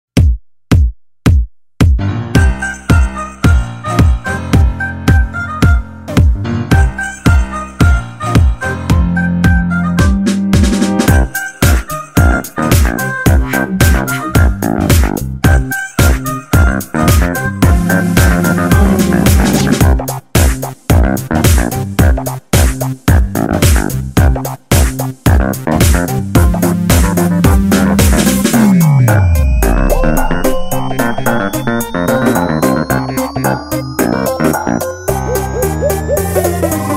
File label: title song background music